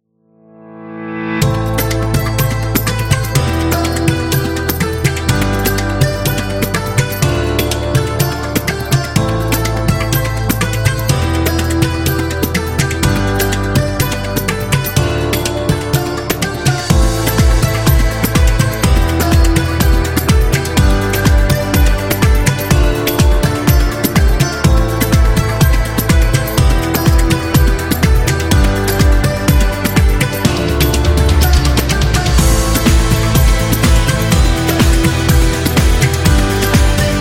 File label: Hindi